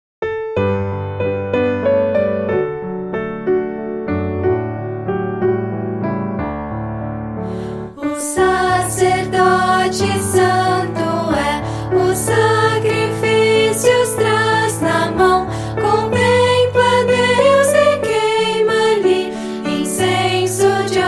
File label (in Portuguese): voz piano